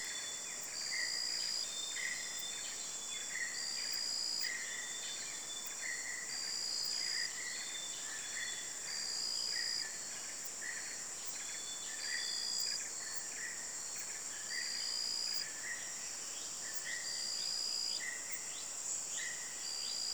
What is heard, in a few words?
Selectively Logged Forest, Berau, Indonesia